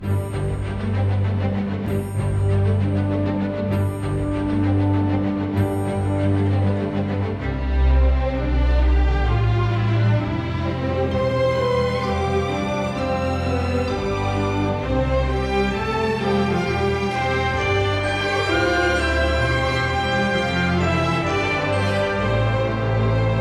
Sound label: boss victory; Manamon Music; Manamon 1